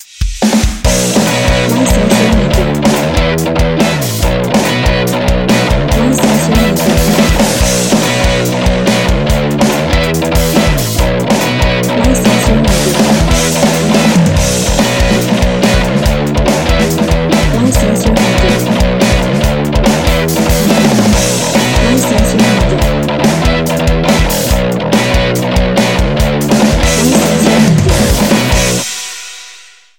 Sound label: Rough Bass